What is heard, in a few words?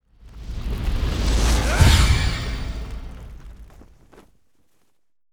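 incubus attack; monster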